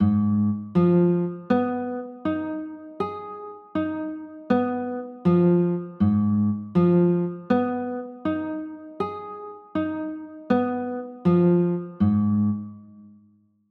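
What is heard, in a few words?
G sharp m6 chord, guitar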